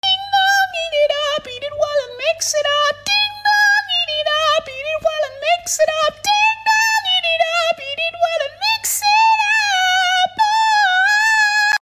ding dong eat it up vocals

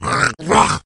murloc attack; murloc tidehunter

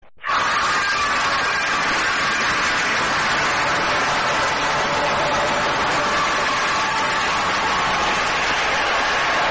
portal bass boosted

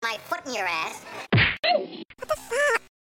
high kick ouch; my foot; red forman

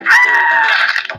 aaaaaaaaah crack

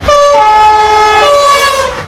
Amtrak horn; liberty

